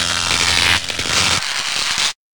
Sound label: break electric